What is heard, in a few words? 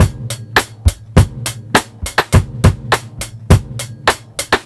BEAT, Roots Funk; USB Soundscan vol.41; AKAI